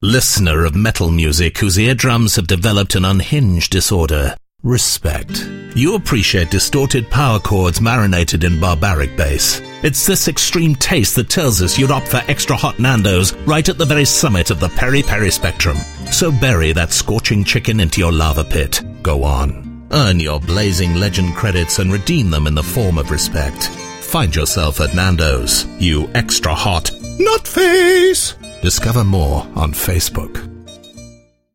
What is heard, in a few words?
nandos metal